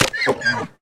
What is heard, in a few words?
zombie pig hurt; Minecraft; Votive World